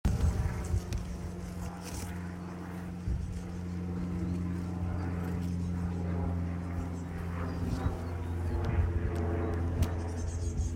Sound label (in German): geraeusch